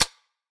reel Bounce; dead or alive 2

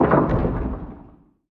quick Stop; mercy of the gods

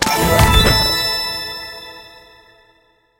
level up; starland 5; golden tavern

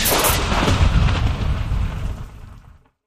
Splash Thunder; wild worlds

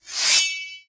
pre big Win; rage of the seas

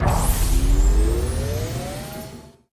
Landing; mercy of the gods

